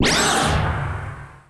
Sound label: sword swing